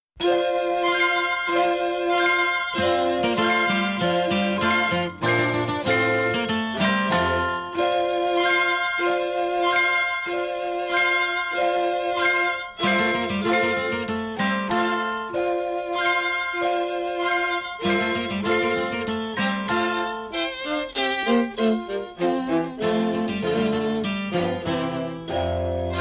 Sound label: Deck The Hall Performance, holiday songfest